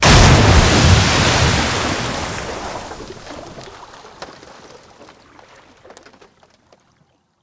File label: Explosions Explode Water; DCS